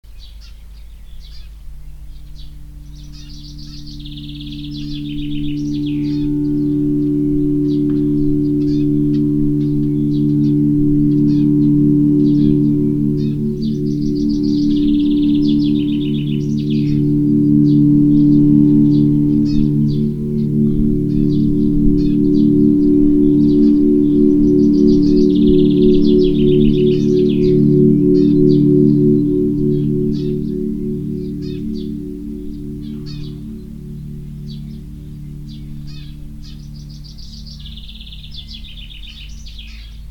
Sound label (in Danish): vindharpe